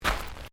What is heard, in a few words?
Footsteps Gravel Step; Crocs; Footstep Foley; Human